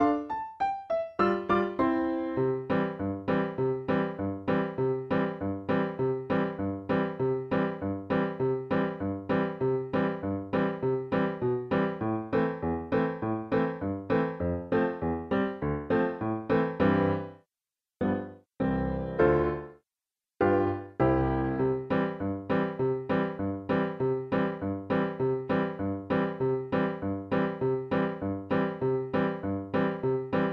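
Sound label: piano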